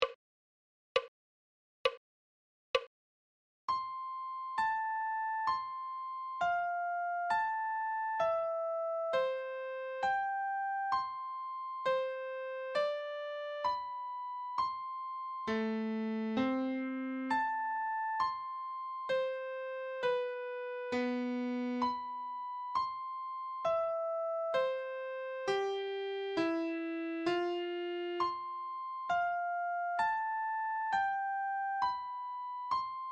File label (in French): clé de sol adagio